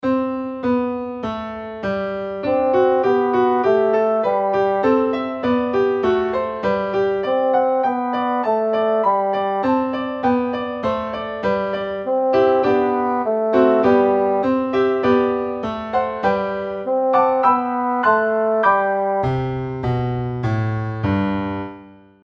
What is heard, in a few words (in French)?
ostinato, basson